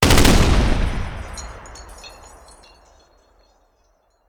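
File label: Artillery Cannon Anti Aircraft; Sound Ideas; Guns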